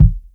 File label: sharp kick drum sound D# key; kick drum samples